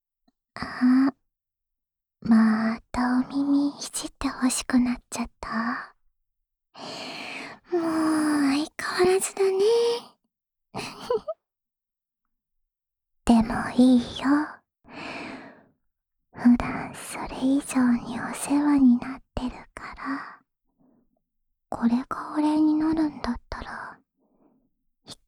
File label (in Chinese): 安眠用梵天掏耳循环（右耳）, ASMR; 安眠用梵天掏耳循环（右耳）